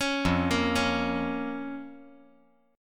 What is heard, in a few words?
F Suspended 4th Sharp 5th F